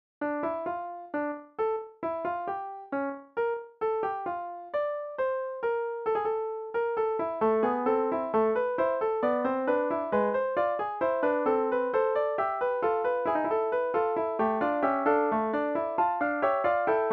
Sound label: Fugue